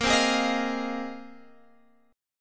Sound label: Bb 7th Suspended 2nd Suspended 4th, Guitar, Open F; strummed; 22,19,20,22,20,x; Bb7sus2sus4